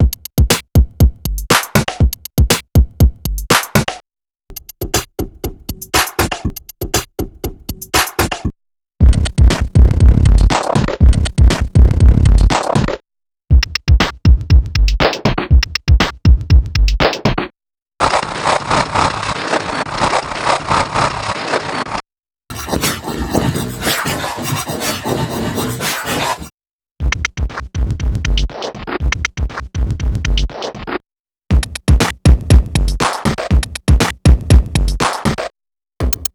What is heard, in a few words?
Drum Loop